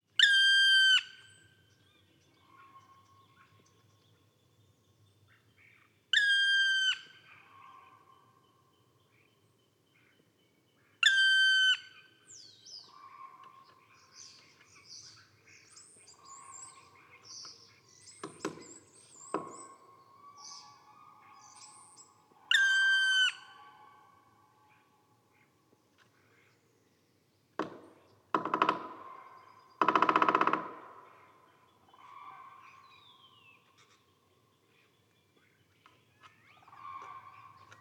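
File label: black woodpecker, common crane flight calls; martius; grus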